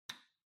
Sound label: Light Switch Box Rocker, Hall Gentle